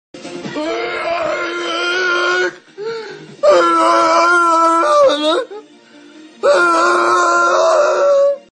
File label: arrrrrrrrrrrrrghhhhhhhhhhh